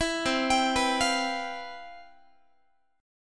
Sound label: C Major 11th, Guitar, chord; CM11 arpeggiated